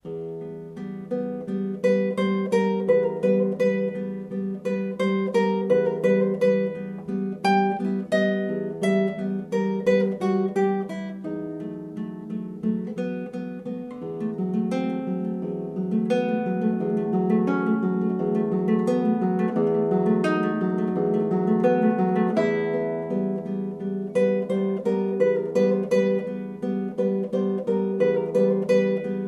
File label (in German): gitarre